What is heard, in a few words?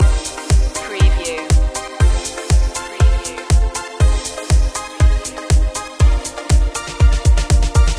dance floor loop